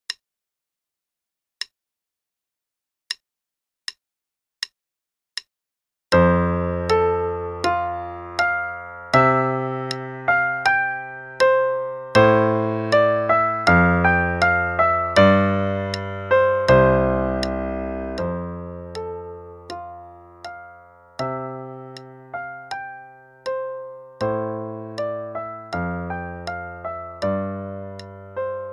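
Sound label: Play-a-long, Gavotte, quarter note=80; Speer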